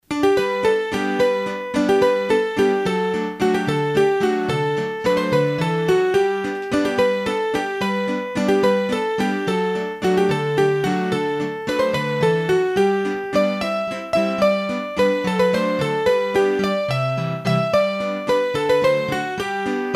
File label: Mazurka, hurdy gurdy; Mazurkas